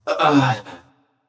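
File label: nonverbal surprised; smite; voicepack; merlin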